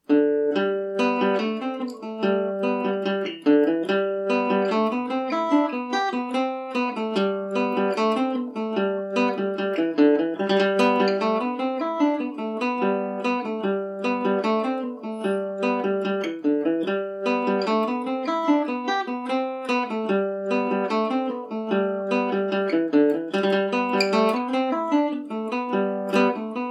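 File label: G major, irish mandolin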